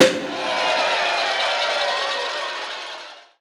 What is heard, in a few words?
champagne celebration; dota; soundboard